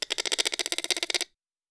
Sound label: exotic bird sounds; soundscrate